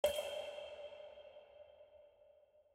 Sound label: drips, injection; Dystopia